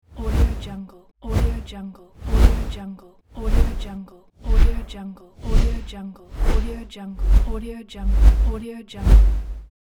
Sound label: deep whooshes